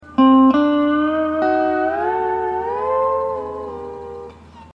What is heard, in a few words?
hawaiian piece